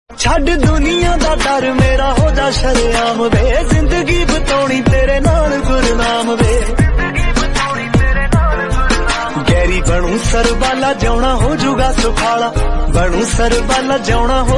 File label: Punjabi Sad Song Ringtone